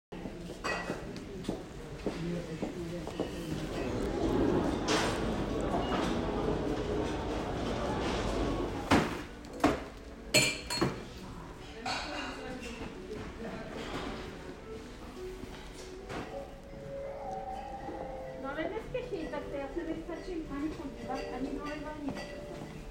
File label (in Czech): Ruch